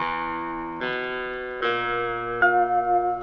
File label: keys, jazz loops